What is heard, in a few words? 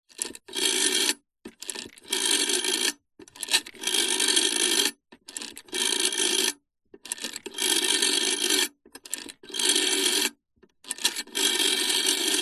household telephone vintage rotary style dial several numbers